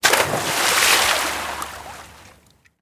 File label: environment water splash